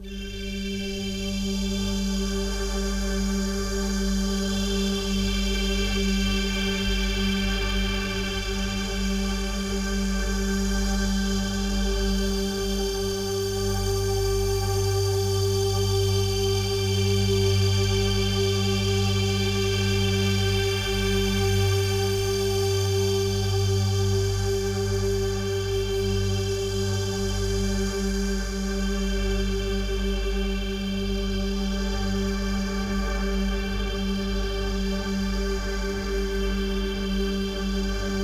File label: Lush Pad G